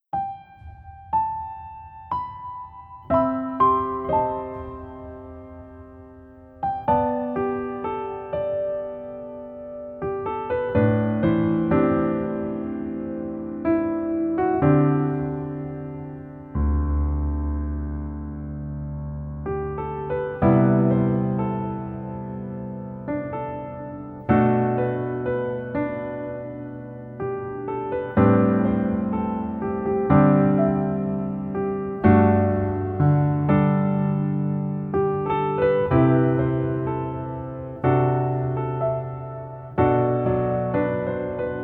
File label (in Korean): Piano ver 멜로디MR